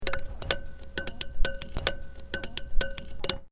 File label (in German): Metallstangenklopfen